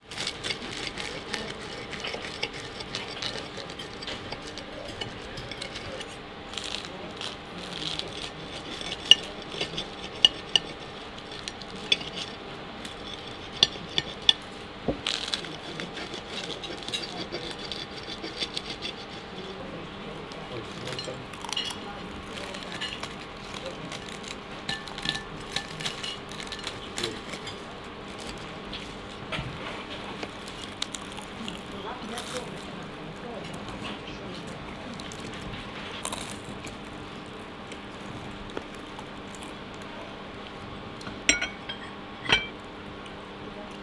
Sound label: eating dinner at a restaurant; human; NS Studios - ultra sound collection